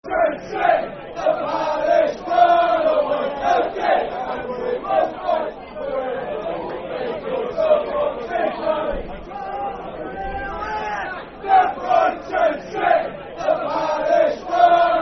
Hark Now Hear Brighton Sing, Football Chant (Soccer Song); Brighton Bhafc